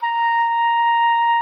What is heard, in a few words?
A#5, OBOE; Xsample VOL-6